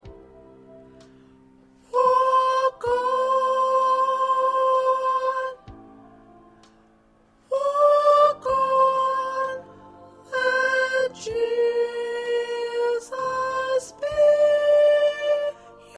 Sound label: Soprano